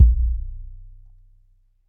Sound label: KICK Resonator Sub